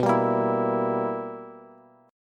B Major 7th Suspended 4th Sharp 5th Bass, chord; BM7sus4#5; strummed

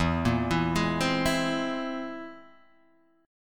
C Augmented 7th E

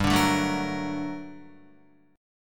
G Minor Major 9th, down, Guitar; strummed; JGuitar

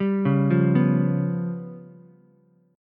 Db 7th Flat 5th, Ukulele; Db7b5 arpeggiated; JGuitar

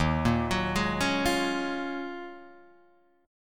Eb Minor 11th Eb down Guitar Drop D; Ebm11 arpeggiated; JGuitar

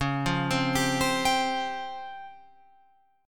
C sharp Major 7th Flat 5th Guitar Open F; C#M7b5; arpeggiated

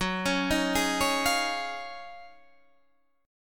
D sharp Minor 11th F sharp, chord

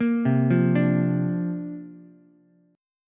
C 9th Suspended 4th Ukulele C6; arpeggiated; C9sus4

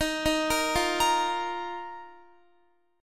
D sharp Suspended 2nd, Guitar; D#sus2; arpeggiated; x,18,15,15,17,18

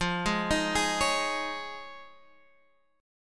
F Minor 7th Sharp 5th, Guitar, Drop D

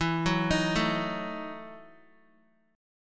E Major 7th Suspended 2nd Suspended 4th, Guitar; EM7sus2sus4 arpeggiated

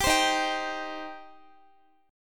Eb 6th Eb, Guitar; strummed

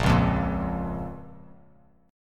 A sharp Minor A sharp down; strummed; JGuitar

